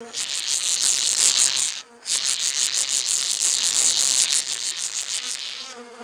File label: close up rhythmic wasp buzzing; animals